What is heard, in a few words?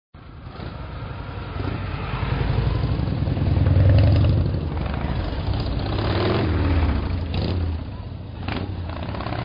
HARLEY; Harley de passage